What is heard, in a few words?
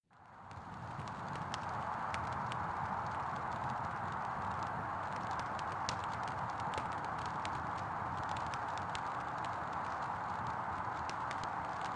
Burning Out Sfx